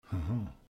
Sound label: Moan